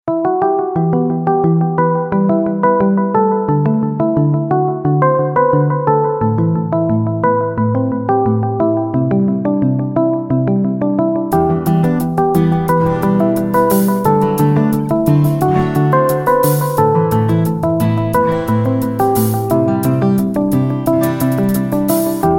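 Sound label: calm melody, just pleasant music without words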